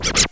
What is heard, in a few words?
Scratch Pull; Drums; TG300B; GMPlay